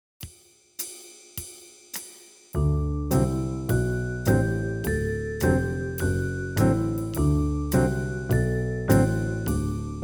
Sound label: D And A Major Pentascales